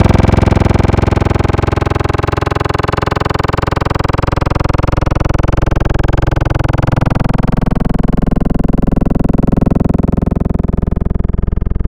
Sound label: Growl, Wavetables